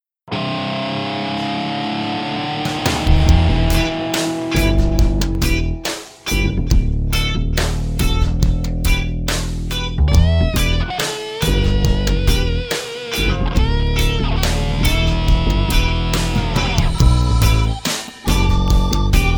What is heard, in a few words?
trailer music